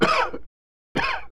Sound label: COUGH MEN; SIMUZENSOUND